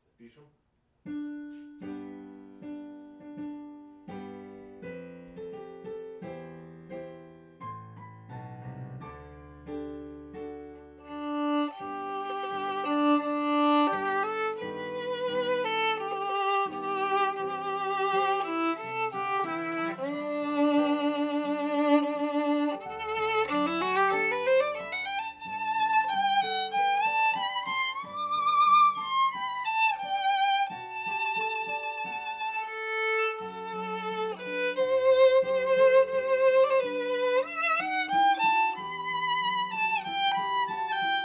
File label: Viola C